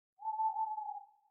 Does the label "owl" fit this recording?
Yes